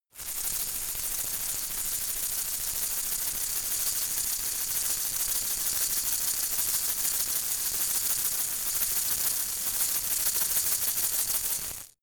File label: insect shaker rattle